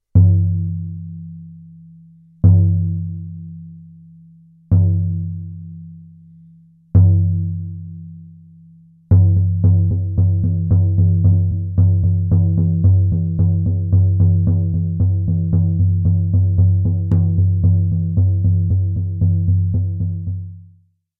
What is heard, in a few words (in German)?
Hirschsound, Trommel